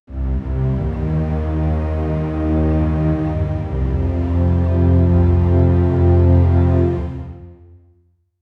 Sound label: thriller genre cinematic